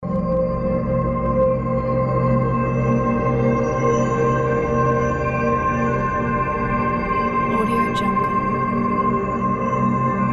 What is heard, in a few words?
cinematic background dream